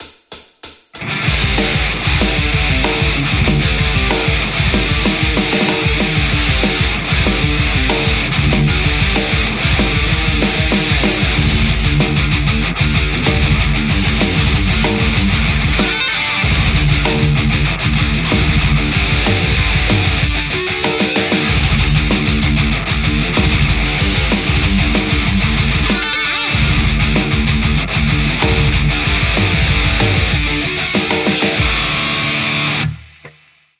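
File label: metal Telecaster; poulin legion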